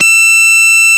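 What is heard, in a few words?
Distorted Guitar E1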